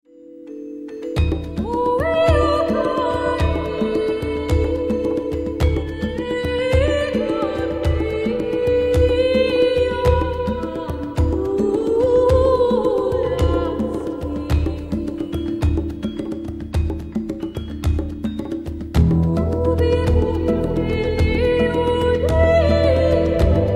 bgm

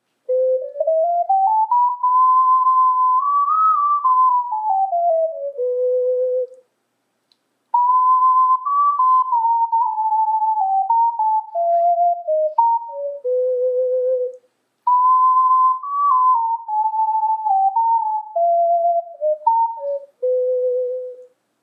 SeedPod

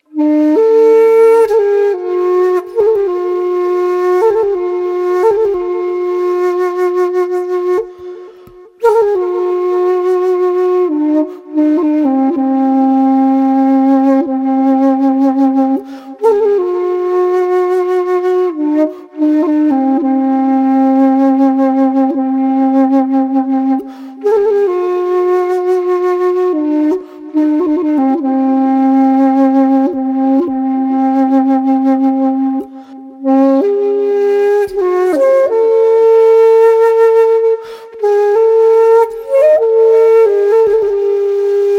anasazi

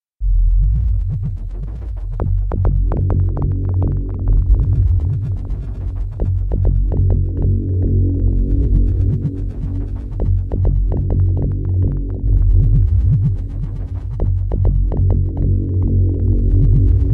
bgm

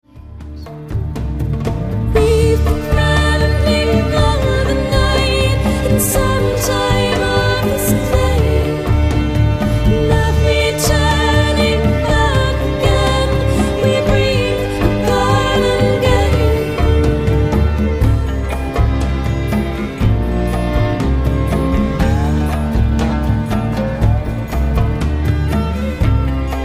bgm

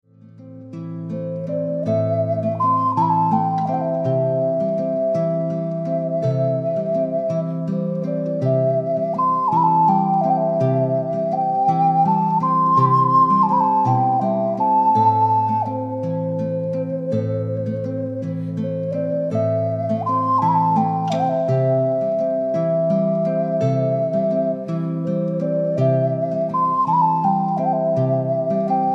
bgm

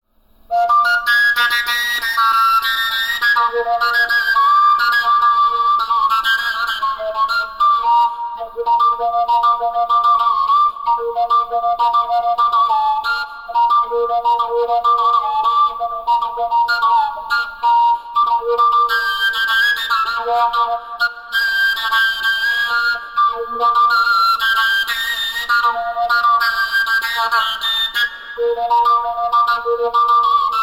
overtone

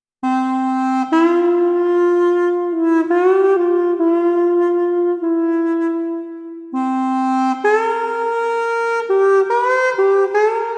pocket sax

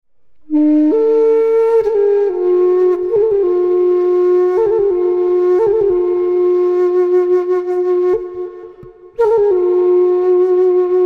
first flute song

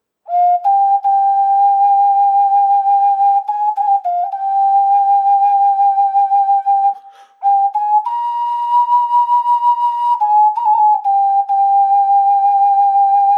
ocarina; ロシアのククサ・アントンさんという人の作品

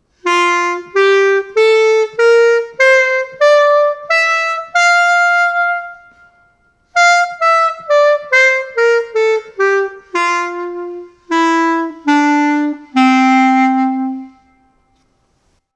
woodsax

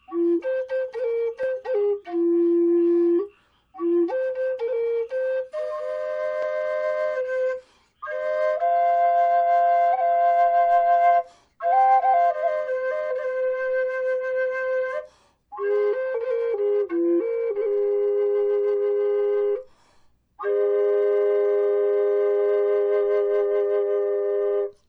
snake flute

